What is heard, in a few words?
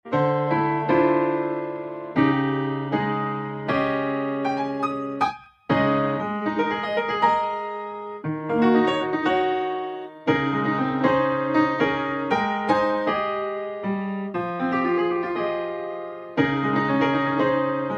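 accompaniment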